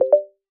notification message

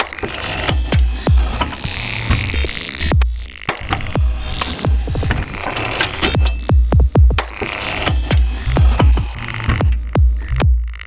beat

